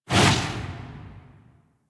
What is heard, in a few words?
Operator Focus Cloak Blind Burst; Warframe; Operators; Powers; Attack